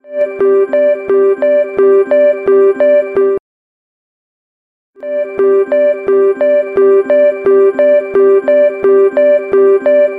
alarm sound ringtone

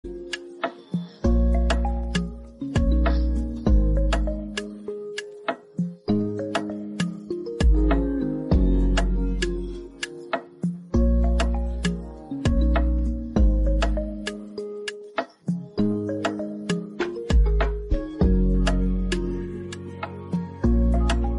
instrumental ringtone

